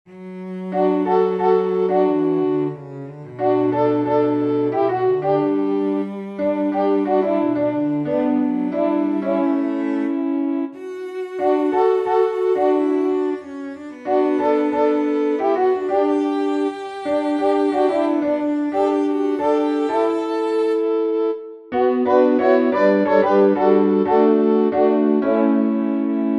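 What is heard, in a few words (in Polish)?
niech zstąpi święty duch do naszych serc tutti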